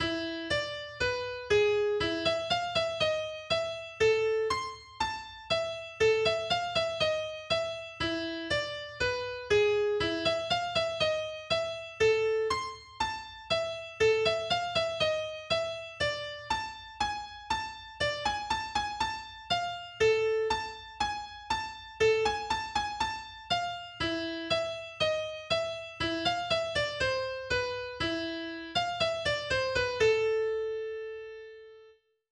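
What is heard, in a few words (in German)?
La Cumparsita Traditional Solo Akustikgitarre; AkGit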